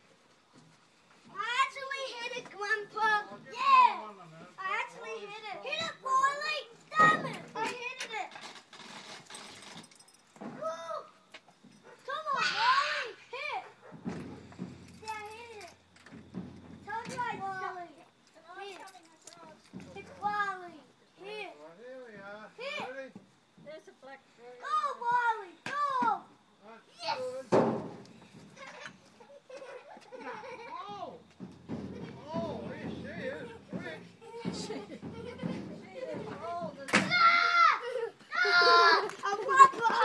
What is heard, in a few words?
backyard cricket with grandpa